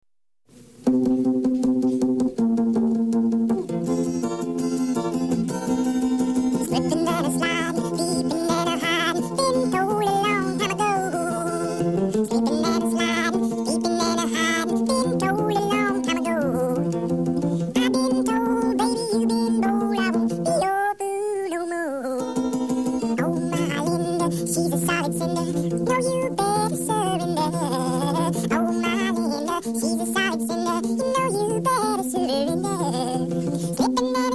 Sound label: Slipping And Sliding (half-speed)